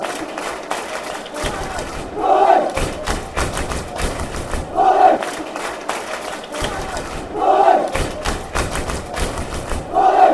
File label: clap, chants